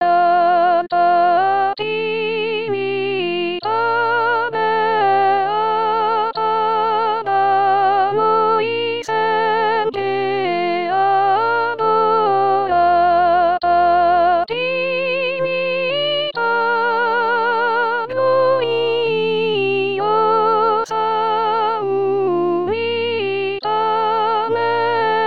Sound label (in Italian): Alta Trinita Beata Soprani; anno liturgico